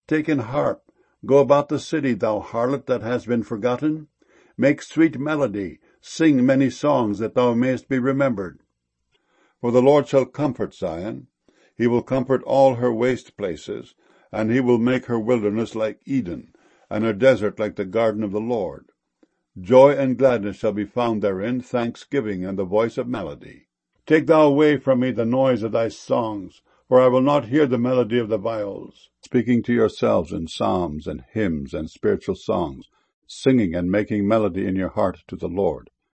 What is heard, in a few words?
melody